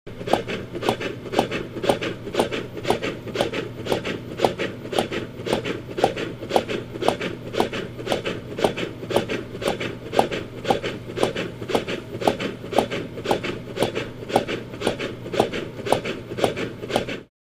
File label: Heart Simulator; Run Fast, Steady In / Out Breathing With Light Bellow Inflate / Deflate; heartbeats